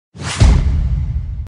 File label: boom explosive title